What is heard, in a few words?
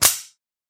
gun loading; soundscrate